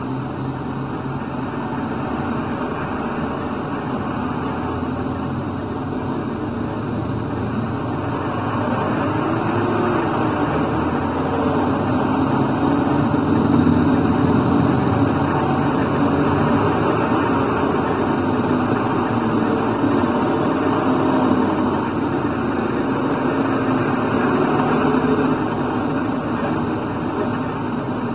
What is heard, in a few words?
traffic